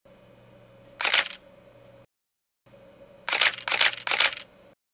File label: nikon d50